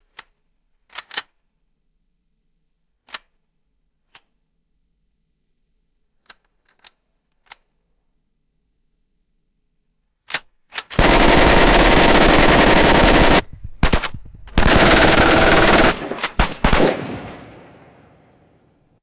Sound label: machine gun cocking and firing short bursts; guns